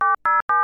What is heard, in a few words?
telephone